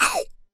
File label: Bite